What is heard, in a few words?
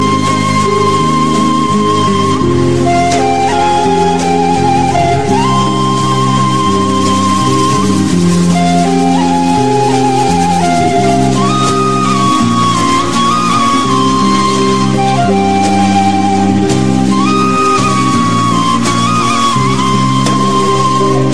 flute song